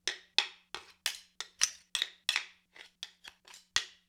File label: Dagger Axe Blade on Haft, Weapon on Weapon; StillNorthMedia